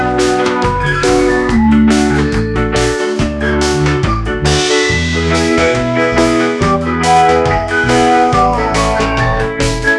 beach in the caribbeans; musiclm

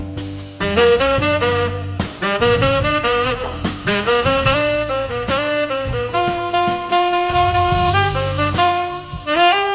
jazz with saxophone, bella ciao jingle bells; musiclm; melody conditioning; whistling

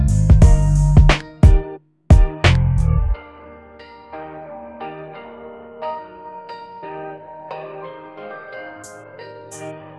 trap hip hop; musiclm

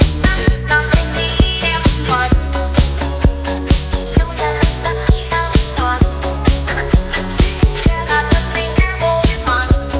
club in the 80s; musiclm

